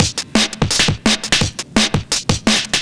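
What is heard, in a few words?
Drum and Bass Beat 170, drumnbass 170bpm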